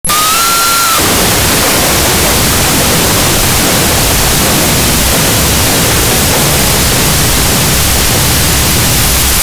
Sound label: Barn Door Close; 1001 Sound Effects; Foley